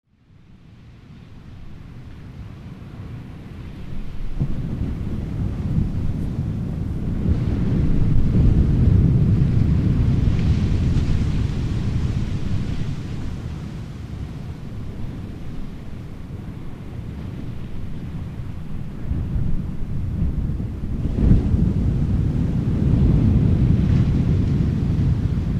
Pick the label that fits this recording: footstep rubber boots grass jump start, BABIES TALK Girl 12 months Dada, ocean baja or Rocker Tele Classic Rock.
ocean baja